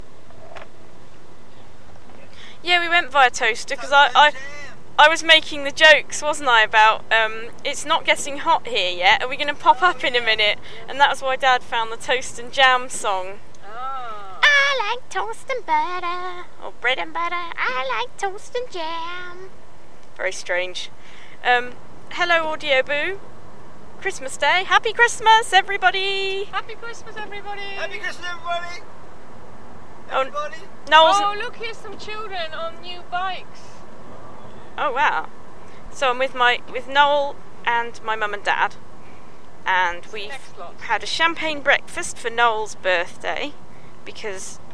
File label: christmas day walk along willen lake including waterbirds